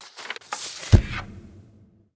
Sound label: note drop